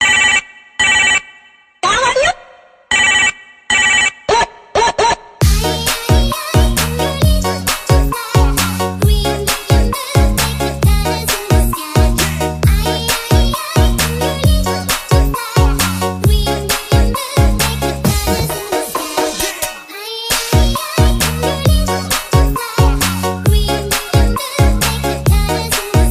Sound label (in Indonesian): nada dering hp mainan anak aiyaiyay DJ